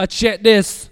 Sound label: VOX SHORTS; Megabass Remix! 2